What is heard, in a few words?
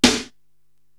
SD Ambi Pop, Korg TR-Rack drum machine